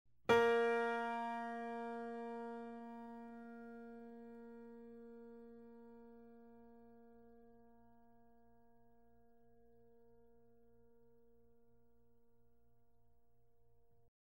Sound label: Tuning Note Bb Concert; A Guide To Jazz Improvisation